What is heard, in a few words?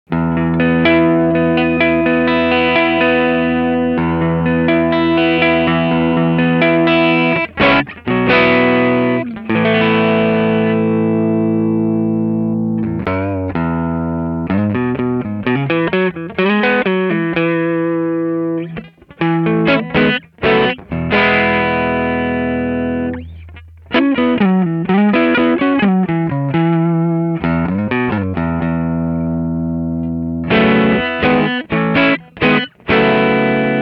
6 Neck, guitars; Wilson